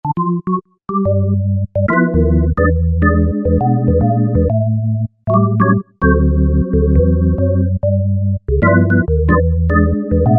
Perc. Organ, Korg microKORG; Factory Voices

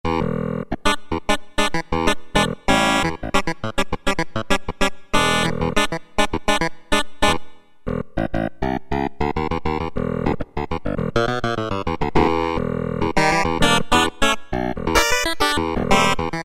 Clav; Roland D-110

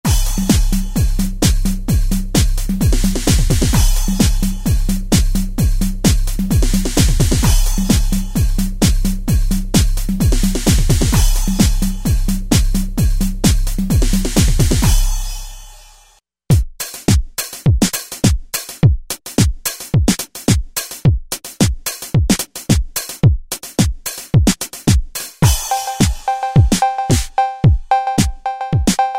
House Kit, Roland V-Synth; Factory Patches

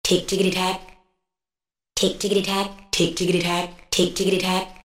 125 TicKyTac, House Collection; Roland SR-JV80-19 House Collection

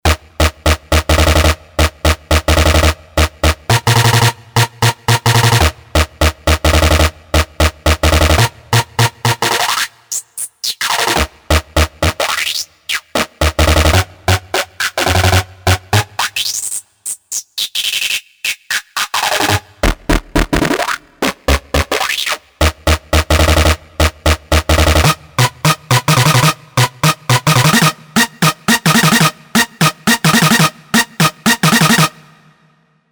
Keep Up, Novation UltraNova; Factory Patches

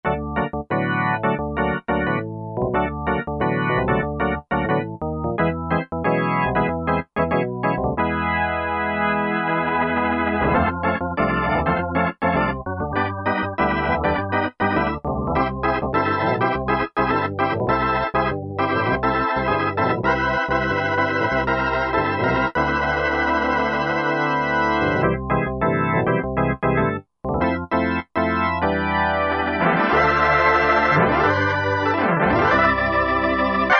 Roland JD-990; CLS 222 (Mod) 2; Factory Patches examples

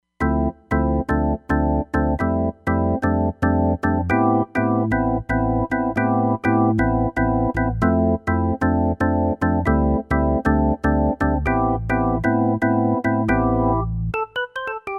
X Perc Organ, Roland Fantom XR

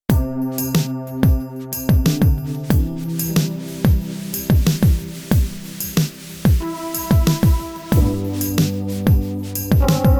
Bliss Beat, Korg Radias-R

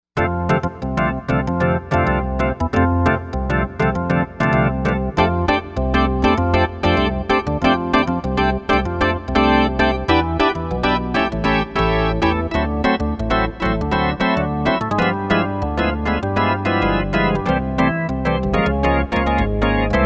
Organ, Korg M1Rex; Factory Patches

